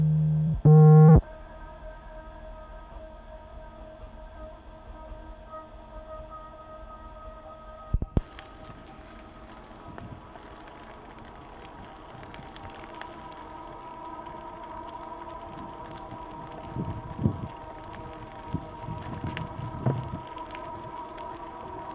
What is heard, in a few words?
evil tension